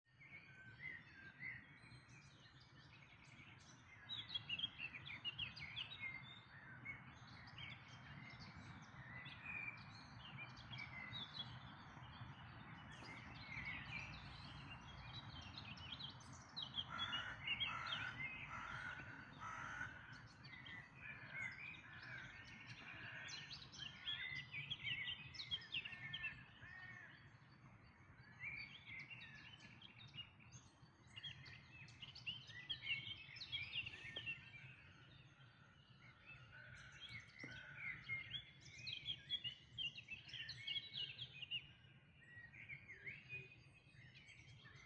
Dawn Chorus, Irgendwo im Gebüsch war definitiv eine Mönchsgrasmücke; Berlin; Der Dawn Chorus 2025 fand wie jedes Jahr im Mai statt

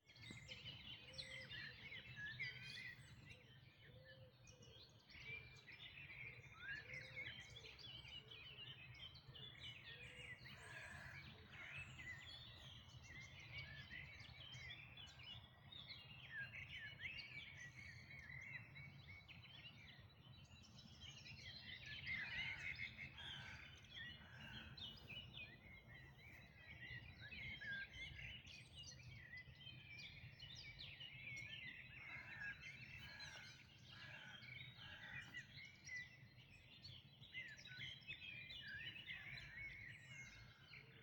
Dawn Chorus, Vogelstimmengewirr in den frühen Morgenstunden; 4:42 Uhr; Teich; Der Dawn Chorus 2025 fand wie jedes Jahr im Mai statt

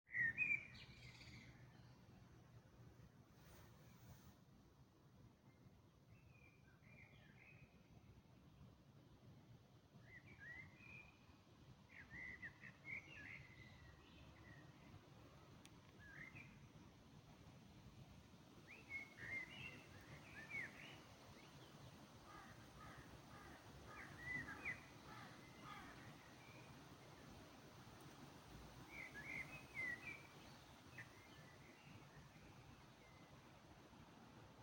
Dawn Chorus, Vor allem die Amsel wurde immer aktiver. Ein Fußgänger mit seinem Hund ging so früh am Morgen auch noch vorbei (am Ende zu hören); Anton-Saefkow-Park; Der Dawn Chorus 2025 fand wie jedes Jahr im Mai statt

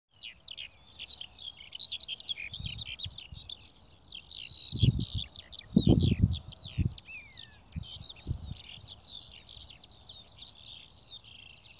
Feldlerchen

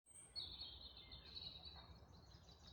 Rotkehlchen; die stunde der gartenvoegel 2025

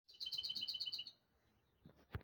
Klappergrasmuecke; Insel Poel; Mecklenburg-Vorpommern

